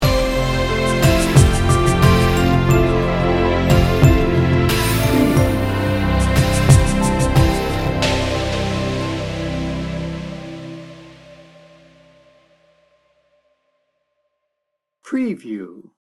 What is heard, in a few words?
sting bumper